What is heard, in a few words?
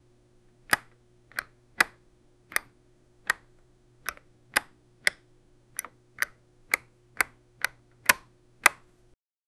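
menu button sound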